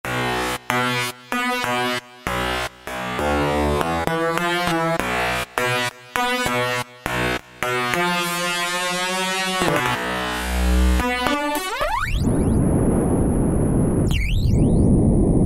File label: T Teknoise, Roland V-Synth; Factory Patches